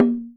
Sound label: TASHA, ETH PERC; WorldSounds